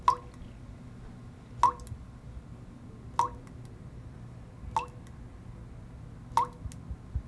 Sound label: water drops